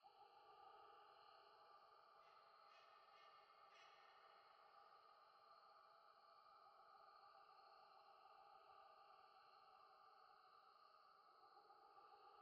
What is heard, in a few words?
int temple; Titanfall 2